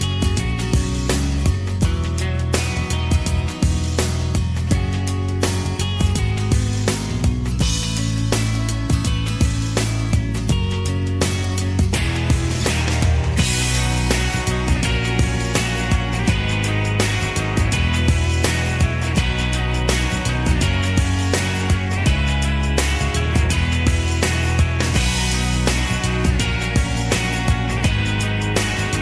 My Sad Story

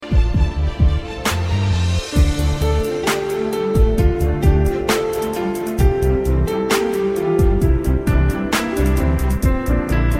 Piano

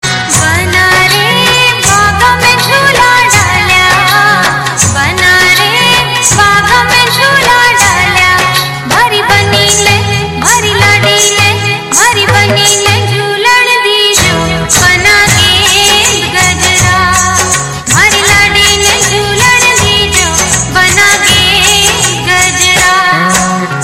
Rajasthani

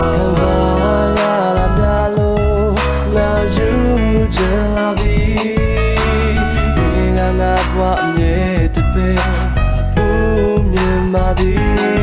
Burmese

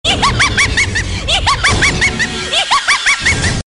Horror

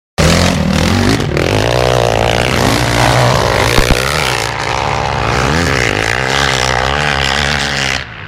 Honda Crf450r

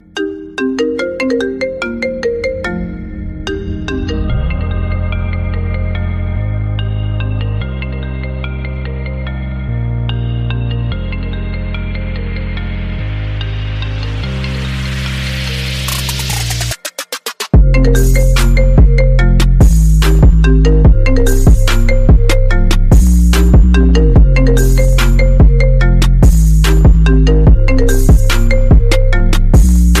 iphone 6 song

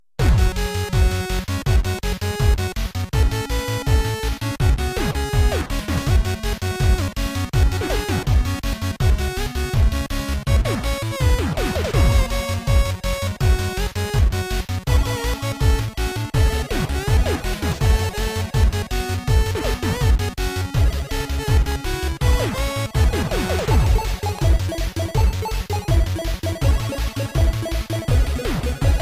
Amazing Horse 8bit

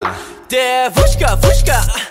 moan

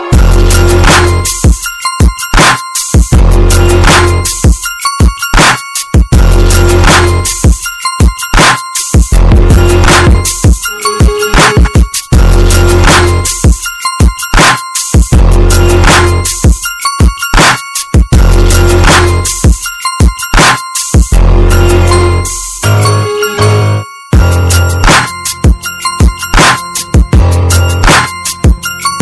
Max Bass

